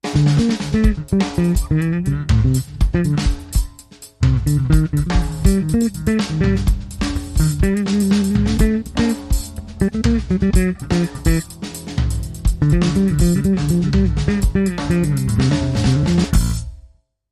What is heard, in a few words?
Solo Bass Groove